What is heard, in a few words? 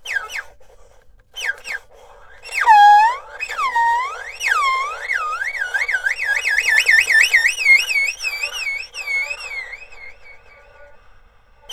ERHU BIRD; INIS - Opium; ERHU MAGIC